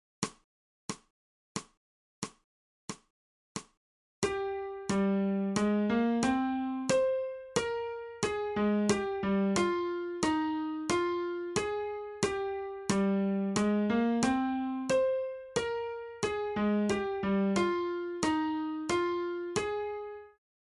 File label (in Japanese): 新フレットレス